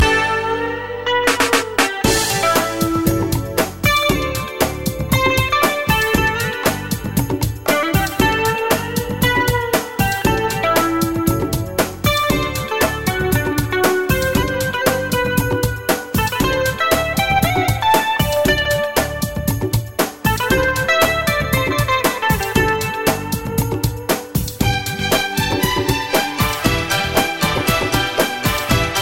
nice ringtone

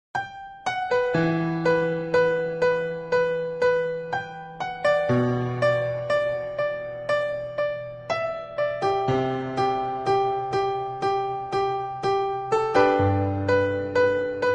intro piano; ringtone

